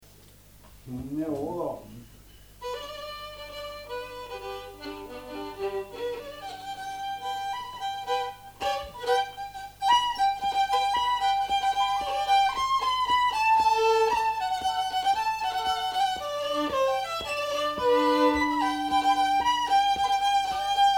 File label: polka